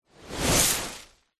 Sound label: foley tree leaves branch whoosh impact designed